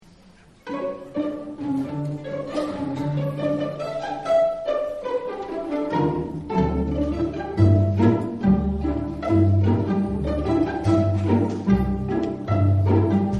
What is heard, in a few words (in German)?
pizzikato, orchester; regio-orchester